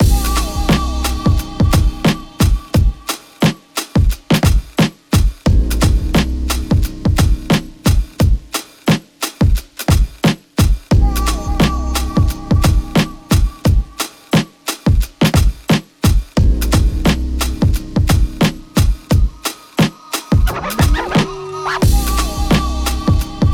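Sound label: HIPHOP bpm88, ヒップホップのビート・トラック、リズムトラックのループ素材; 動画制作などで自由に使える16小節のリズムトラックになっています。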